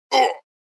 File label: Man pain ohh